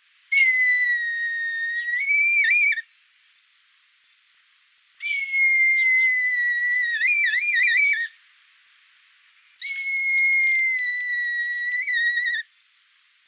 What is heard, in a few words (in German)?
Rotmilan